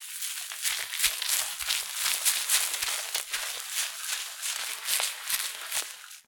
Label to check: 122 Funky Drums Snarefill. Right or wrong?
Wrong